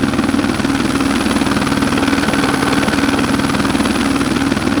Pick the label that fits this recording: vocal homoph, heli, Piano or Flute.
heli